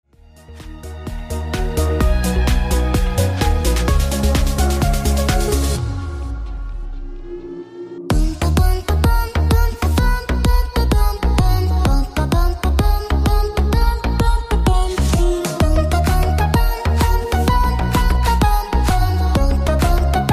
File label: The Drum Instrumental